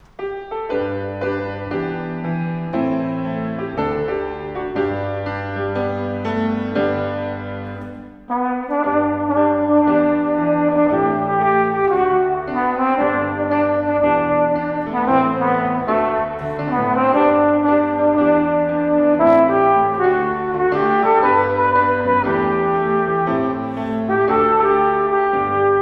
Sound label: karaoke